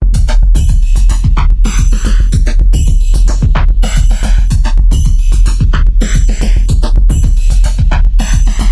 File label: bassline drumloop; bassline project